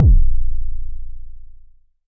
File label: Jomox MBase, drum machine; Jomox MBase 01